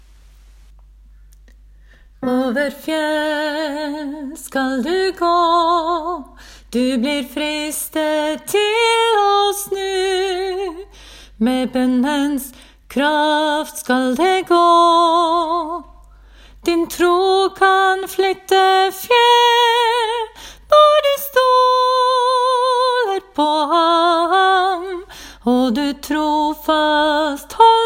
vers sopran; åfjord

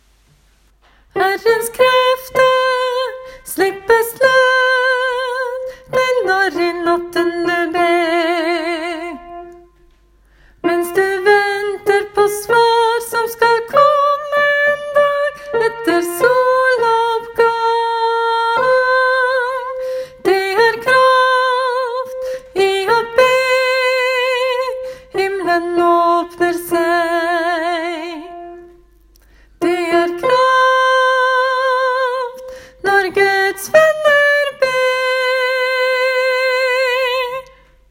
refreng herrestemmer; åfjord

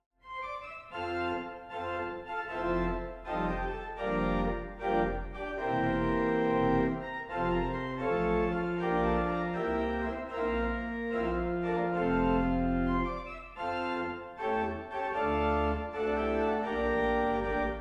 brudemarsj, kirke; seljord; børsa kirke; skaun; brudemarsjer

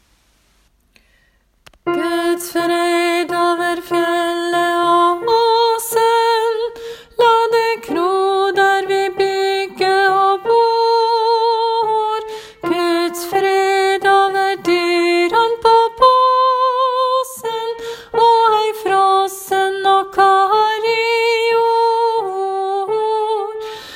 korpsversjon tenor; nordnorsk; åfjord

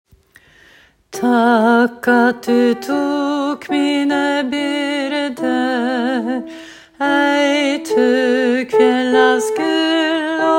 takk at du tok alt; åfjord

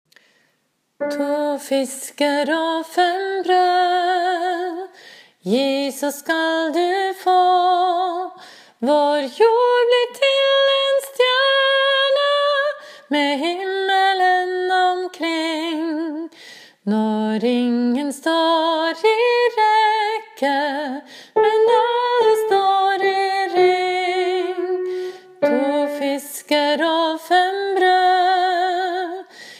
overstemme tenor; åfjord; to fisker

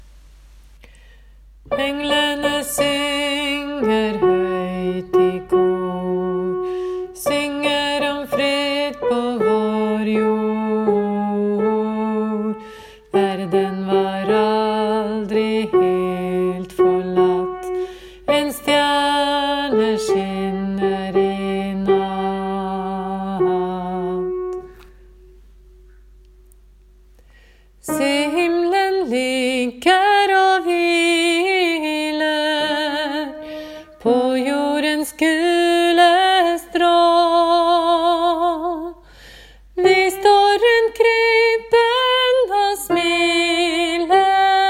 tenor; en-stjerne; åfjord

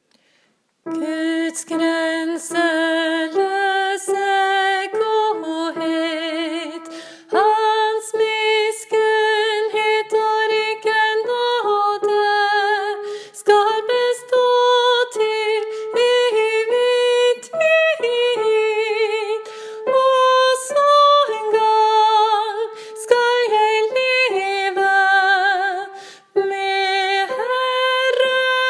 sopran; salme-23 1.; åfjord

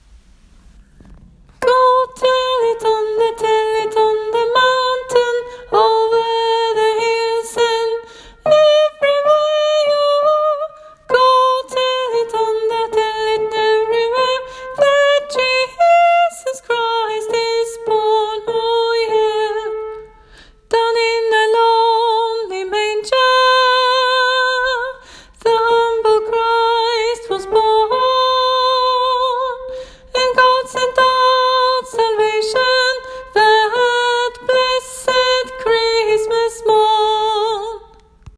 tenor; åfjord

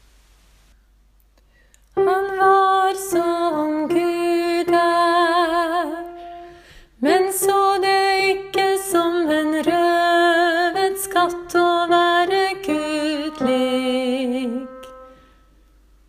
sopran; åfjord

